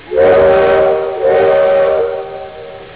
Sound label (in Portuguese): Apito Partida; locos